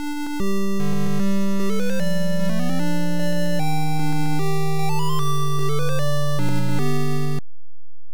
Catch Triforce Fanfare; Zelda no Densetsu

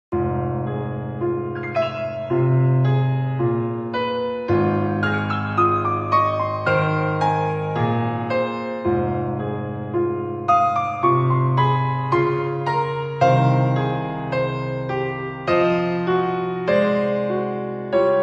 Snow Chalet Piano; MySims Agents

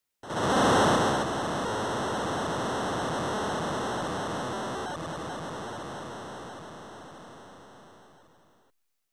Air Castle Appearance Sound; Kaijuu Densetsu The Tale of the Shell Monsters

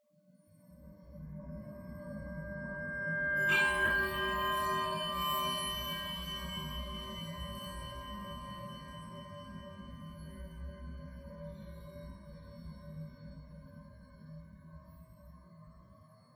Bells Interior

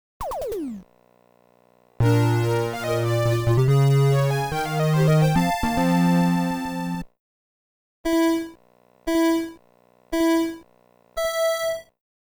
Race Start Fanfare; Legend of Game Music Premium Box

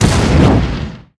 BAZUKA attack

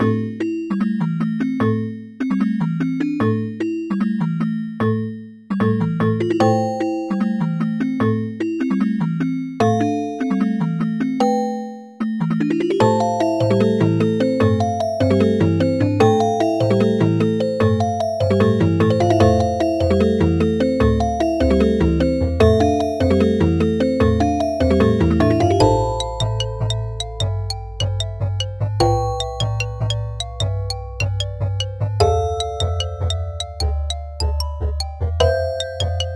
Musicbox; Shep

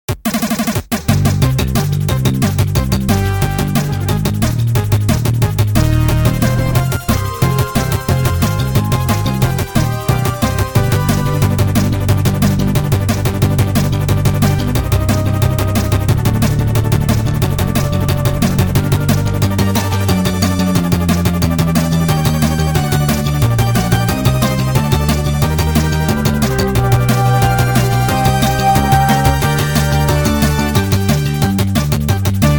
Space Flute; horace ps4 switch windows xbox one gamerip 2019

